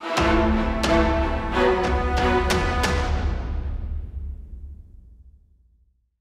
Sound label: Stinger; Reska the Pit Boss